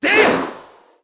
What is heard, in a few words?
Sword Spin FX Mono; Link